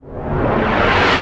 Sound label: skill charge; SkillSound